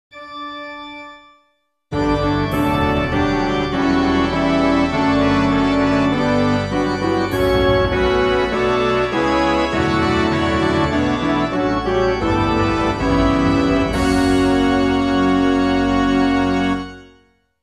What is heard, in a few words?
we proclaim your death midi